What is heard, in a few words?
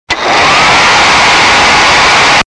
hair dry, machine motor